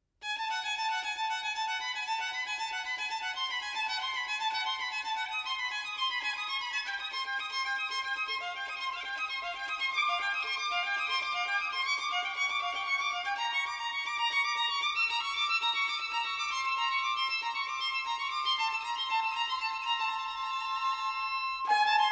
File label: Violin Concerto